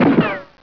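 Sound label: ricochet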